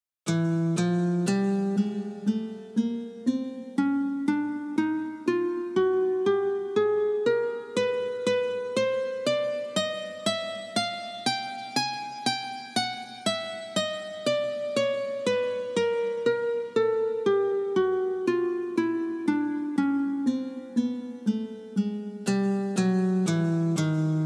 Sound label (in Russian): Uprazhneniya dlya rastyazhki paltsev